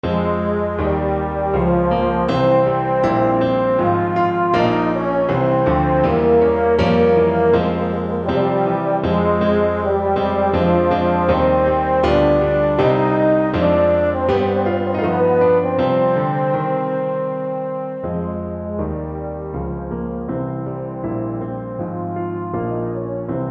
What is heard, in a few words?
euphonium